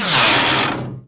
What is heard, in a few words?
fx gear no